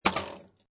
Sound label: Pinball Sound Spring; Video Game Sound Effects; Video Games (Sound Effects:Games); Lynne Music